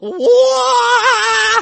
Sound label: woaaahh scream; Luigi